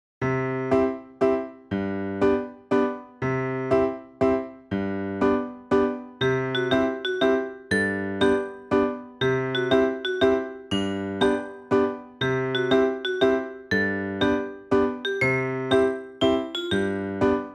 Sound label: BGM DayTime